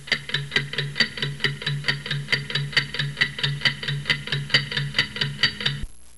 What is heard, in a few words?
Mech Alarm Clock; psion cd vol1